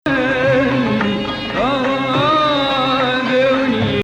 Hijaz, maqam

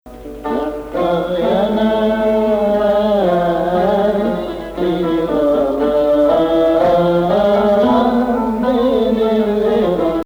Hijaz, maqam

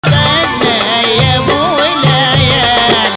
Rast, maqam

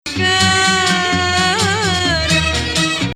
Hijaz, maqam; Rast

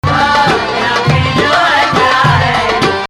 Rast, maqam

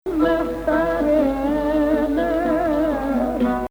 Bayati, maqam

